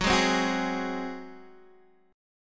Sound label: F sharp Suspended 2nd Flat 5th, Guitar, Open D